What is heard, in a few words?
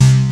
Prophet Five BASS C2; E-MU Formula 4000 Series Vol. 3; Analog Odyssey